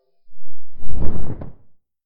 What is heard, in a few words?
curtain fall; Apotheorasis